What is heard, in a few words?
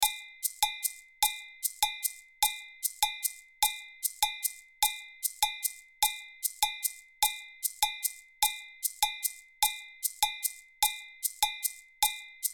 6 against 4